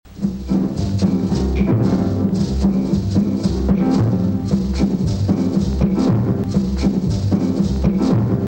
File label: rumba